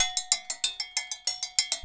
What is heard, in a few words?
bulu grooves; Best Service; World Colours